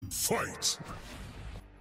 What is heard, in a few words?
Fight